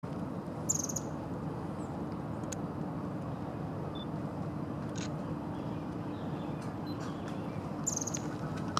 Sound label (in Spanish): Piojito comun